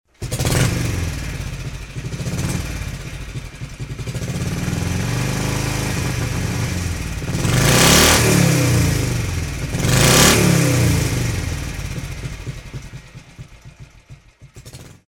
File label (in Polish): junak M10; dzwonki